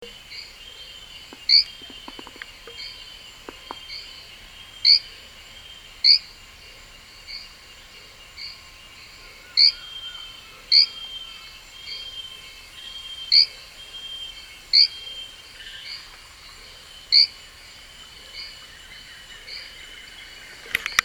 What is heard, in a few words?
Adenomera hylaedactyla